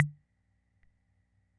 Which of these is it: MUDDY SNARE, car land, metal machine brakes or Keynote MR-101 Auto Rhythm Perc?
Keynote MR-101 Auto Rhythm Perc